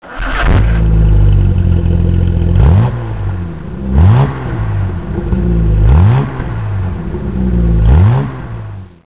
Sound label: e type